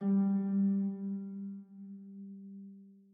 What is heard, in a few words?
harp